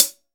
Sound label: Hat cl tick; Akai MPC500; Hats TKit